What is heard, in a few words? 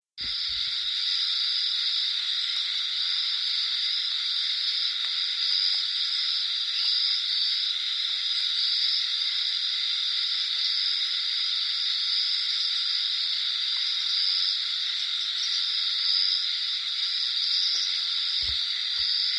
Chiasmocleis shudikarensis